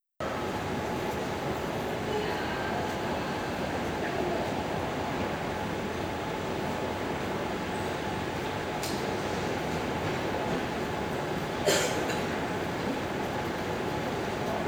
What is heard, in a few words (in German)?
Flughafen Istanbul (Original Video Ton), fieldrecording